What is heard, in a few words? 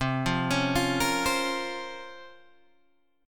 C sharp Major 13th, chord